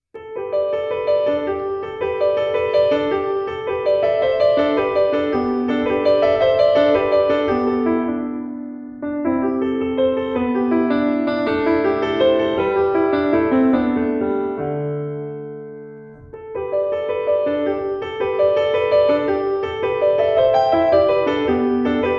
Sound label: piano solo; 5. sats fra Syv slaatter og impresjoner for piano solo